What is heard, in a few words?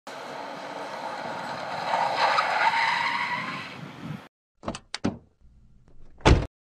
car speeding and stop with door